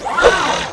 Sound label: ATTACK